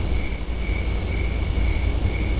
crick

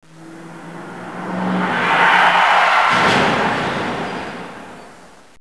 car level sounds; svencoop; amckern

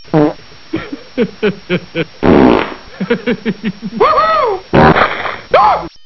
fart; homer; dmc

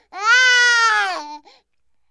baby; svencoop

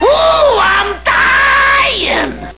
ducky die

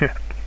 scout, deathpain; dod

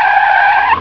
tire screech; svencoop

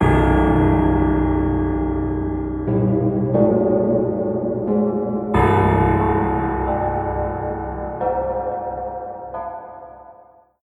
all items; svencoop

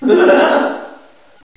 giggle

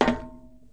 pl tin, player; cstrike

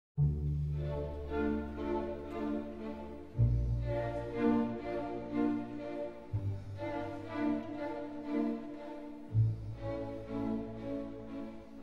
serenade

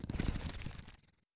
drone movement; svencoop; aliens drone

